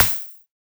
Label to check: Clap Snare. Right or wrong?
Right